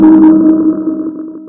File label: Sonar; 3DShooting